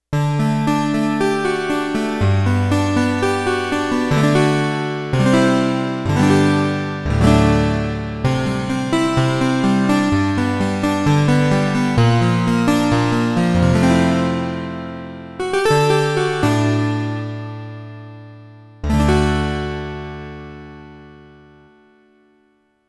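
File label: 12 string Guitar, Roland VC-1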